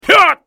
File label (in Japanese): 男 攻撃短; マスケッティア